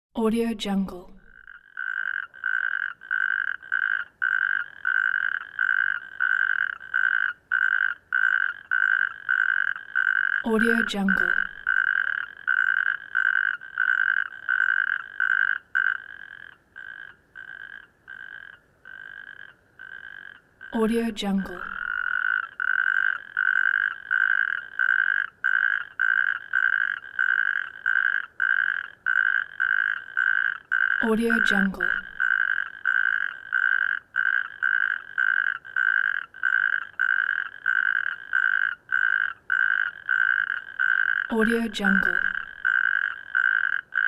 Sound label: night frogs; road tunes